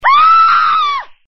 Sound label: Girl Scream